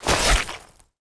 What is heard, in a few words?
Dead Impact